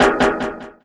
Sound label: Vinyl Snare; Sonic Foundry (Sony Creative Software); Esoterik Beatz; Vinyl101 Kit